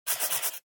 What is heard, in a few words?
Mechanical Pen (Fill)